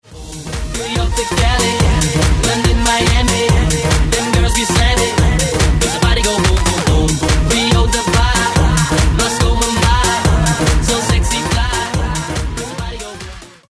funk rnb hits remixed; multitrax

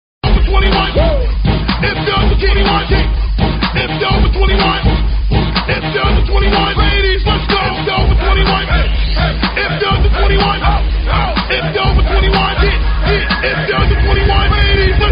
funk; multitrax

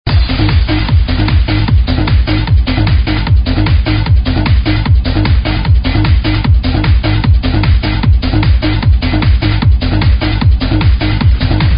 kick; multitrax

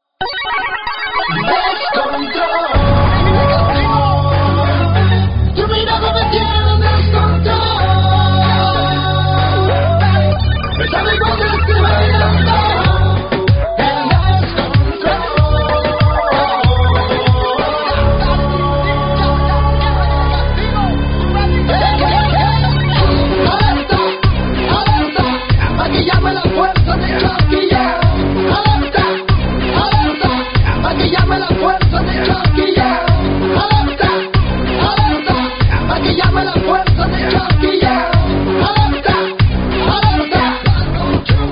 reggaeton, fitness beat, funk

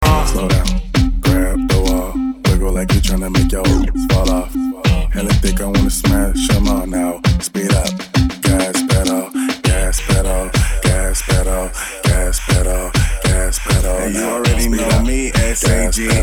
funk; multitrax

